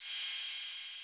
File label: Miami Cymbal